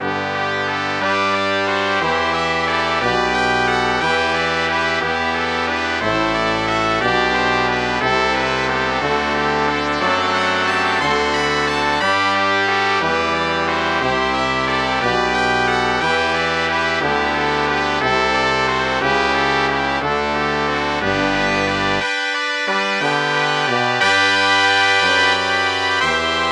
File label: trumpet